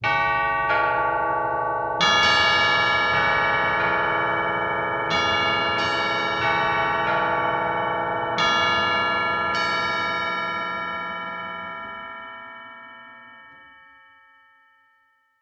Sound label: evening bell, alarms; Google; Google Sounds; Reality Bytes